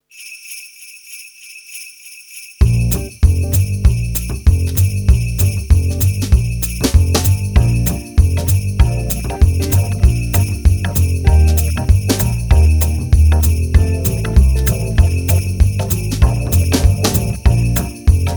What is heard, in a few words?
jingle bells karaoke